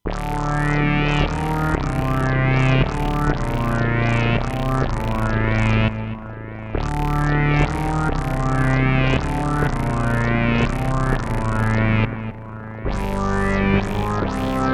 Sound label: Grumpy Slimeball from Outer Space, sci fi